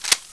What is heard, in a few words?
colt reload clip in, weapons